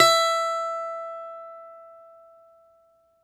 BALALAIKA, Prima Balalaika, Plucked Strings

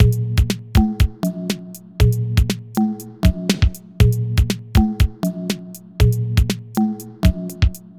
Evoluon Beat, Composite Beats; Sonic Foundry (Sony Creative Software); Myths of Technology

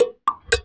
LOOPS

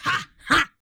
VOX, VOCALS; Ueberschall; Jam Box

